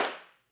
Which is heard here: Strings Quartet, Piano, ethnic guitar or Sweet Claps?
Sweet Claps